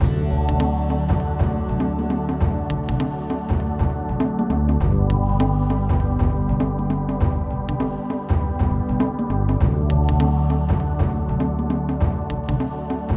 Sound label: Dressing Room; barbie horse adventures mystery ride